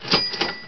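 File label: CASHREG, cash register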